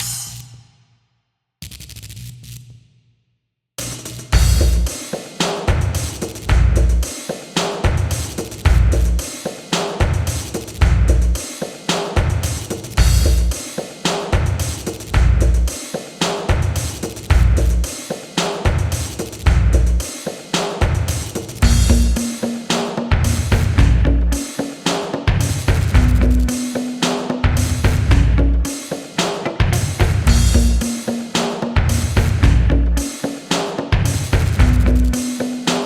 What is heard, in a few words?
music level; freedom fall